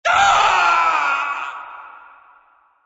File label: death; hl2dm